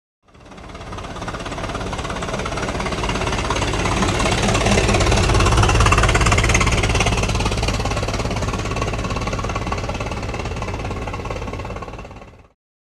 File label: Automobile; By; Model 't' Ford Slow Up And Past. Nice And Chuggy.